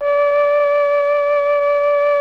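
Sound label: Mellotron flute 8D4; Sonic Reality; Vintage Timetraveler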